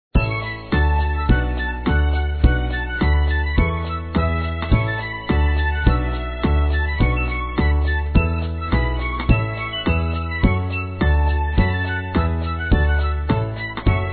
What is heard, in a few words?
luau song; butterfly island adventure